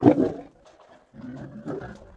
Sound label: growl; Tribes2